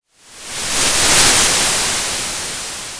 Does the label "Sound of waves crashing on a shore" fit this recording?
Yes